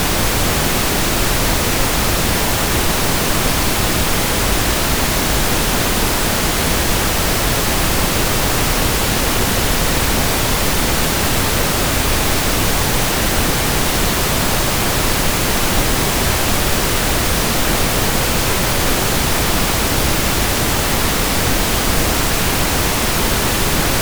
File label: Pink Noise